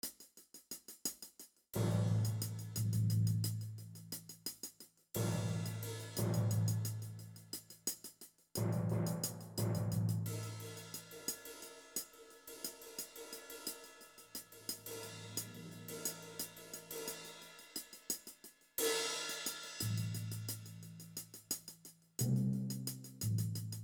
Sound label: Music Amb Perc; MushroomBoss